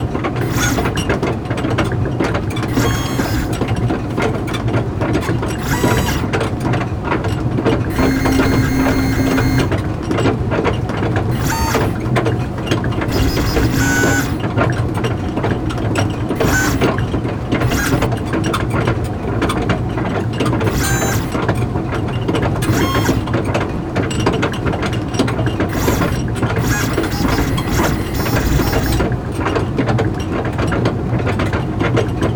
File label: Factory Robot (Loop); ironmansion